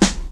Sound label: 00s perfect snare drum c sharp key; Duration 0:01; Mono; snare drum samples